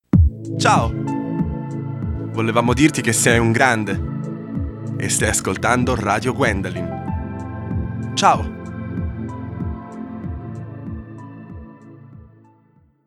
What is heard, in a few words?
Jingle